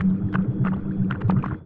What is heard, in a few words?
alien water; nova sound